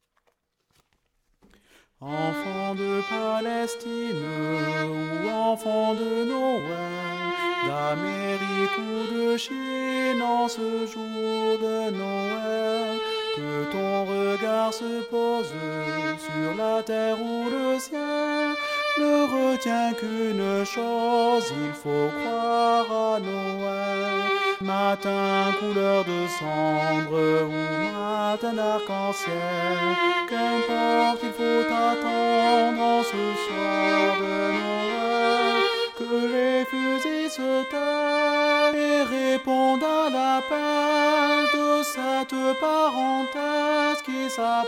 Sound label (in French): Noel des enfants du monde Sopran